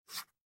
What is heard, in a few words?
Chisel